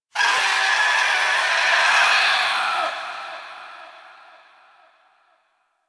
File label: Weave Boss Crystal Spirit Release; Warframe; Cephalon Weave